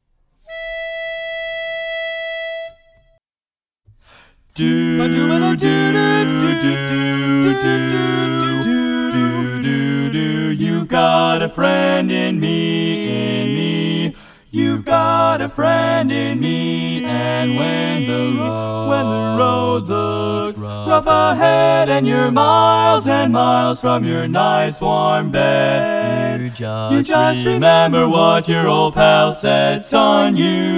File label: bari missing in E